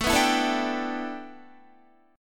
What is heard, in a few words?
A 7th Suspended 2nd Suspended 4th Guitar